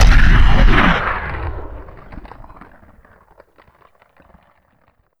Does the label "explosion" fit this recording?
Yes